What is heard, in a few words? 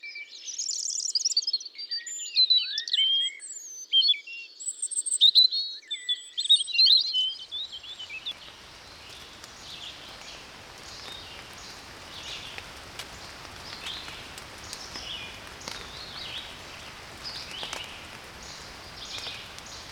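rain in the forest; dozhd v lesu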